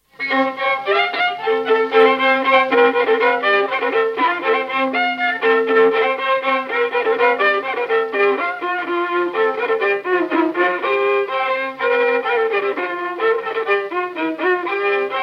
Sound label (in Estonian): polka Paistu viiul folk; Suislepa polka